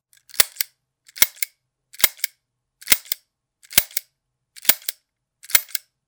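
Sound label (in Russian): звук щелчка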